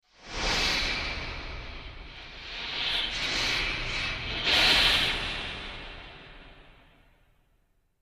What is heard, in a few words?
Distant Steam Releas